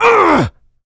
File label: pain large